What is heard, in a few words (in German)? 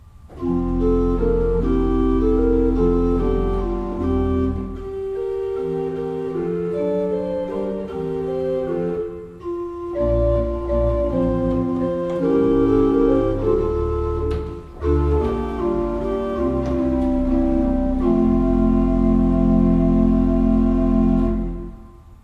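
Rohlfing Kreienbrink 8 II P, pfeifenorgeln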